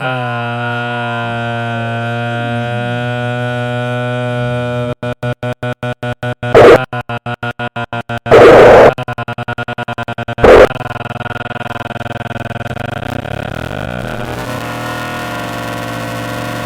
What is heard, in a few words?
Harsh Noise